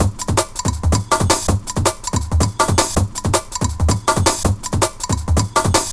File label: Loops, breaks; M8